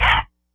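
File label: sample stab, Hardcore Breakbeat 158 A minor; Big Fish Audio - Hardcore Breakbeat Action; Hardcore Breakbeat Action CD1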